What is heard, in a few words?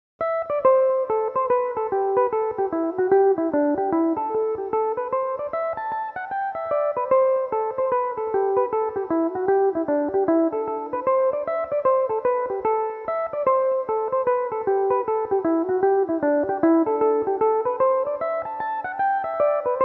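A dorian, irish mandolin, tunes; Belmuse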